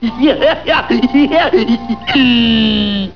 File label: laff; puma